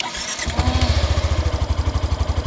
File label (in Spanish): ENCENDIDO IN YBR, YBR125